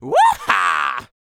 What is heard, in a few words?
YELL, MALE HUMAN; ILIO; Vocal Planet